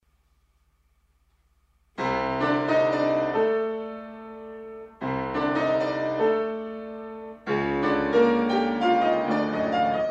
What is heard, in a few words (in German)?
Klavierfantasie